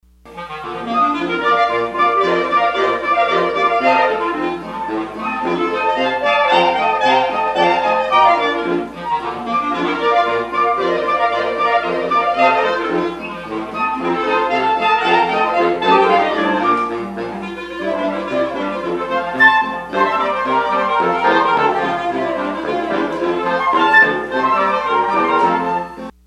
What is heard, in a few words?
clarinet polka